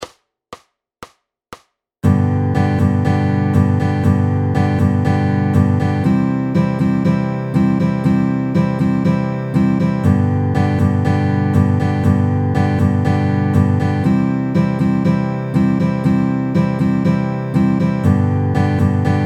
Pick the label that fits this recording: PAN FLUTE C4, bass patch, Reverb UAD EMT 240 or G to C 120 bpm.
G to C 120 bpm